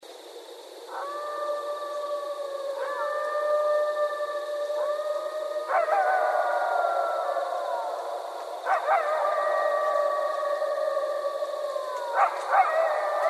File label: Coyotes; Coyotes (Canis latrans)